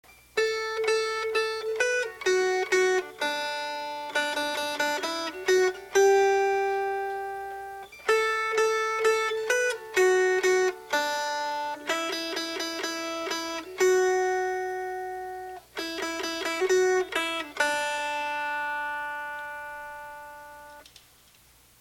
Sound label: Melody